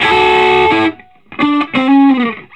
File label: Vintage Blues Guitar, SOLO